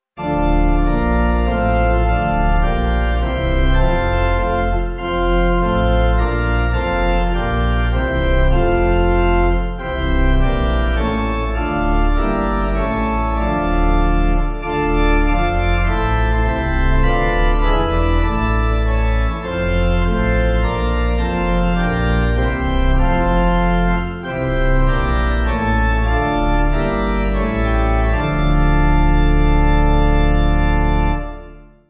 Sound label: Pipe; Ach Was Soll